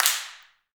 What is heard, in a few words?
handclaps, Heart of Africa